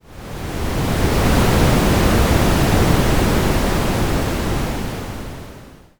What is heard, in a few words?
ambience wind